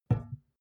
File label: glass door small close soft